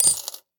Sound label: Coin